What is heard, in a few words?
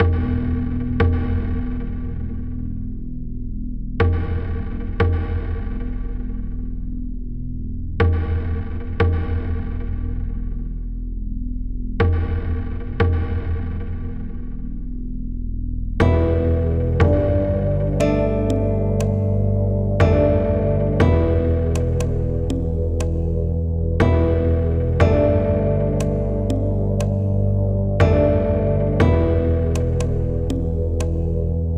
Calm; Serious Sam